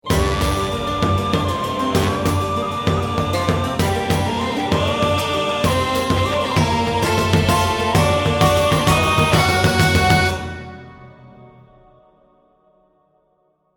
round ten sec count

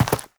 step, footsteps, player, dirt

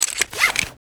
dropzone parachute deploy; Counter Strike Model Pack; CS:GO; survival

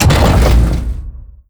bump, waste pit lift

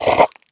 breath in, headcrab black; creatures

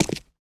footsteps concrete

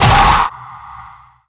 vox shockwave yell, zombie revived

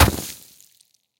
snow impact bullet; physics

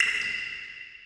distant drip, water; Counter Strike Model Pack; CS:GO

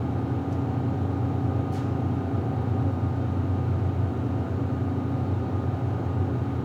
interior office, ambient; nuke

